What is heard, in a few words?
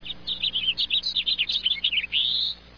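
house finch, birds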